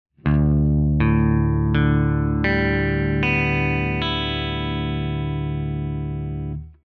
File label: guitar Drop D